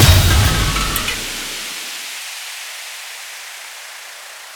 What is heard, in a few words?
fx cinema